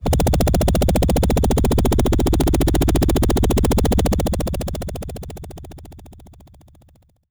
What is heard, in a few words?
Helicopter FX DX100 F1